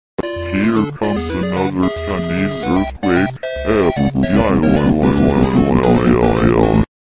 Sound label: earthquake; chinese